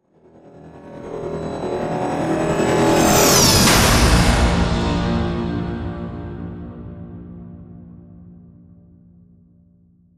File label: Hit Tonal Hovercraft